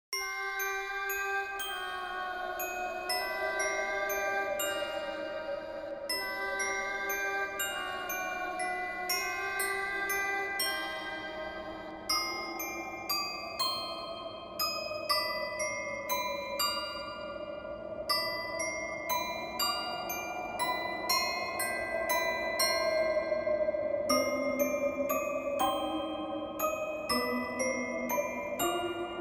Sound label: creepy music box